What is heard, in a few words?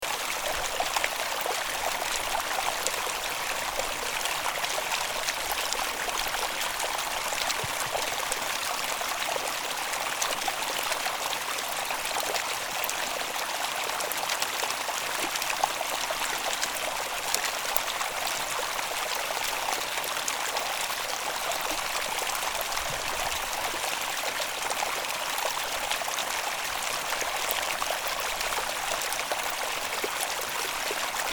high camp stream, nepal